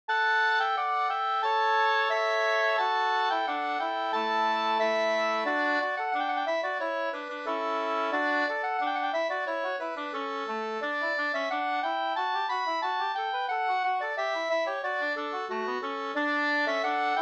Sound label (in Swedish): skanklat